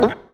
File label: gulp